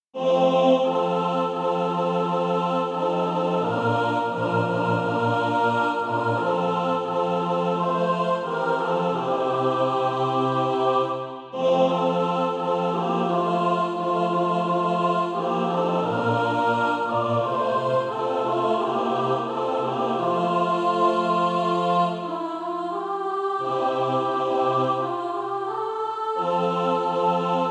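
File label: mixed, SATB Practise Music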